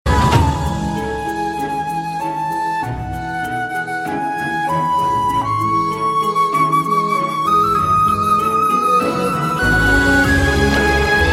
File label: Nice Instrumental